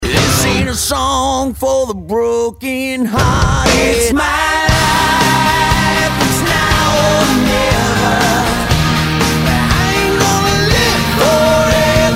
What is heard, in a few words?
Rock hit